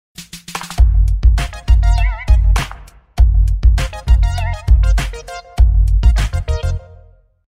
dance bass; fortnite

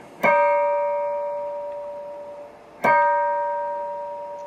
bell

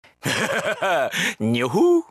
hahaha nyoho; gyro

